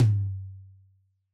Yamaha DJX 2B, Low Tom

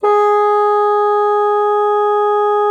bassoon, G#4; Xsample VOL-6

